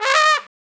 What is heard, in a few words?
Trumpet Noise; Traditional; Sonic Foundry (Sony Creative Software); Jazz Solos and Sections